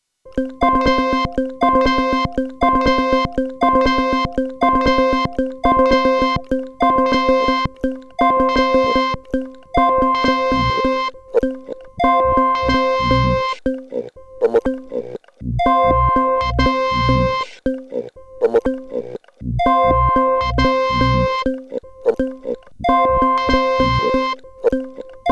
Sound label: Pocket Operator PO-133; Teenage Engineering; Streetfighter 7